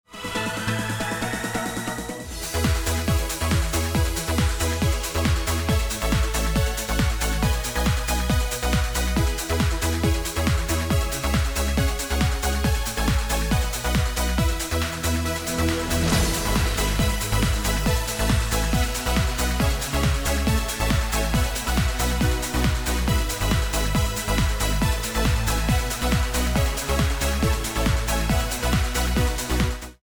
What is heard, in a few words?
bomb planted; music kits custom